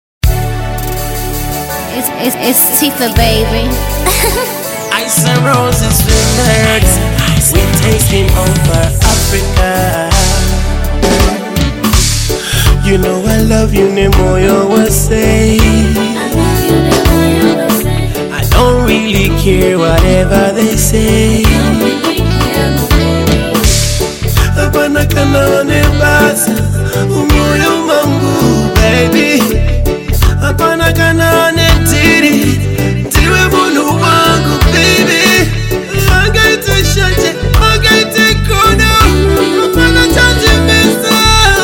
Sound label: Reggae Remake